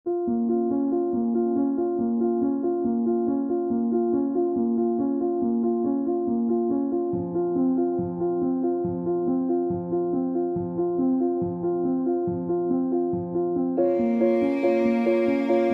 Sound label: sad serenade